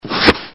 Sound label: suction